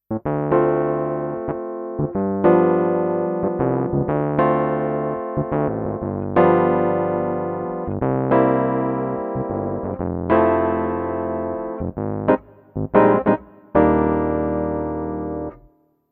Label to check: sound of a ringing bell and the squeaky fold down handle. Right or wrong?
Wrong